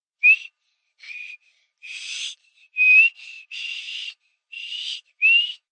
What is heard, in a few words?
Whistle Trying, Whistling, child, trying to whistle, blowing, airy, long, human voice, kid; VoiceBox